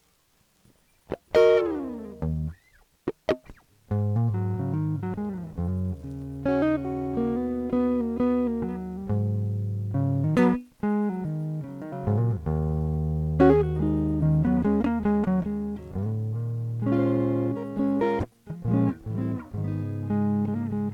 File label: rock